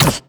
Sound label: bite, headcrab; reviver; creatures